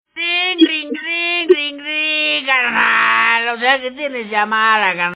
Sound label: Ring